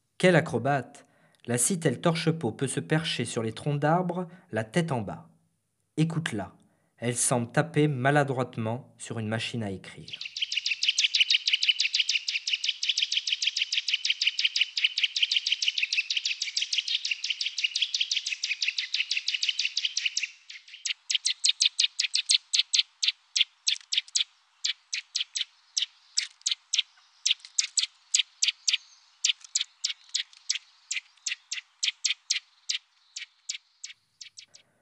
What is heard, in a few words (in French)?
sittelle torchepot, oiseaux